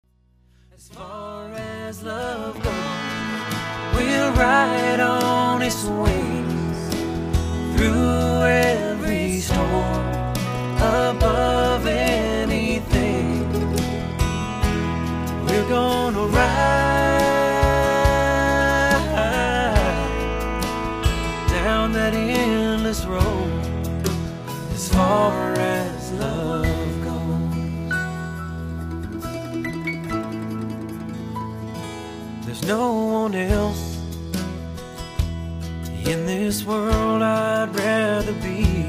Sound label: brushes; Nashville Demo